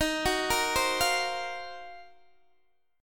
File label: D sharp Minor 6th Add 9th D sharp Guitar DADGAD, chord